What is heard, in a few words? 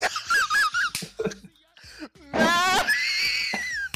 maaaaaaaaaaaaaah